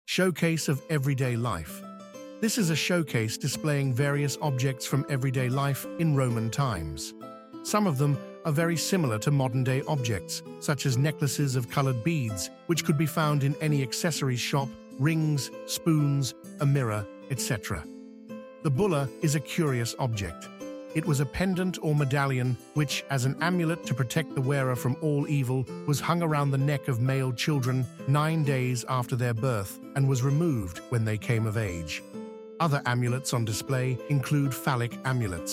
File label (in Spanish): música; archaeological museum audio guide